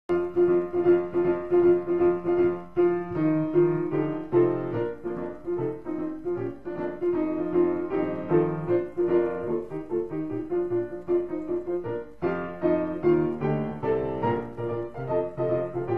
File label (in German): Galopp